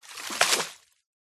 nature water buckets worth throw onto surface of deep water splash